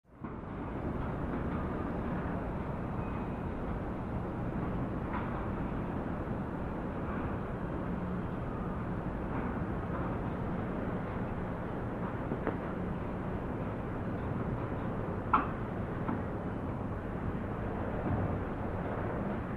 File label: Construction; Off Screen City Noise And Metal Banging, From Outdoors.